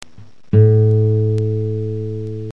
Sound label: nylo la